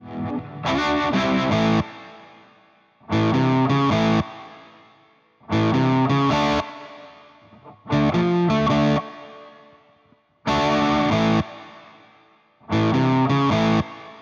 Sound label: Elec Gtr